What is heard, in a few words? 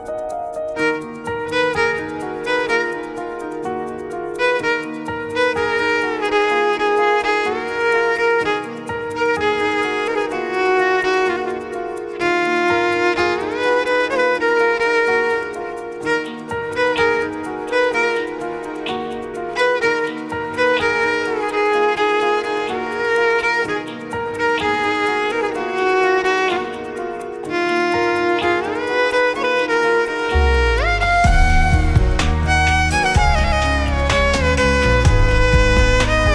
Instrumental Song Ringtone